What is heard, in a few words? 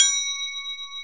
Crotales, Orchestral Perc